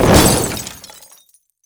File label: break